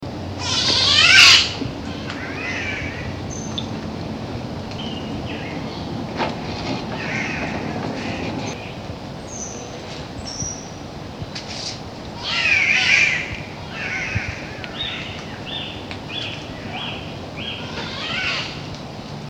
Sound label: spotted catbird